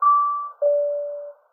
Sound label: 777 chime; pmdg